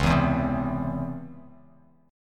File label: Db Minor Major 7th, down, Bass Standard; DbmM7 strummed; JGuitar